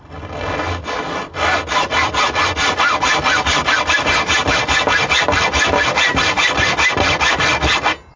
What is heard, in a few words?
hacksaw